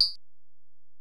EPerc, Alesis DM-PRO

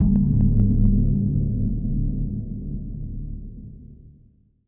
E GONG DEEP, Tibet; Best Service Advanced Media Trax Modern Composer; ETH TIBET

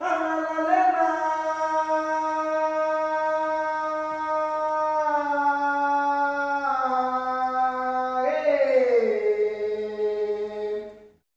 MALE CALL; Spectrasonics; Heart of Africa 2

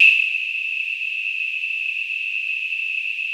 SONAR; Music Store Sound Service; Einstein

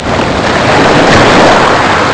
ocean; Amiga Soundtracker Sample Packs

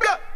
blup

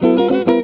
EGUIT CHORDS; Best Service; World Colours

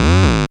bass, f#, Talking Bass; Dance Synths

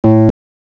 WURLI; KEYBOARD; CMI Sound Library Rev 1.3

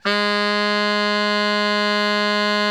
BARI FF G#2, Sax; Giga Samples Collection; BARI 3-WAY

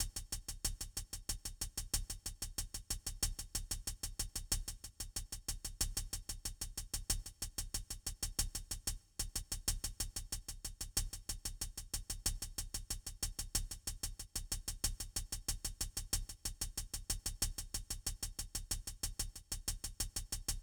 hat, Drum Tracks, 093 Ebmaj

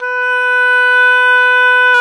oboe, Roland Orchestral Winds; Oboe Brth; Oboe 1

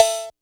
kit, Yamaha Motif-ES8

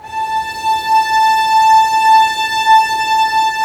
STR SEC, Roland LA Composer, Sec Mute St

